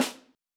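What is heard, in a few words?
snare F; tryThis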